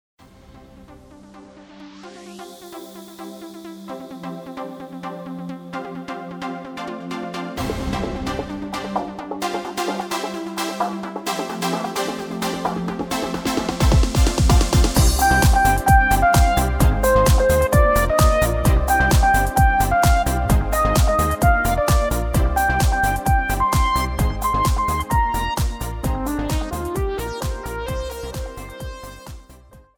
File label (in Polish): wersja instrumentalna